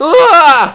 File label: scream; wanted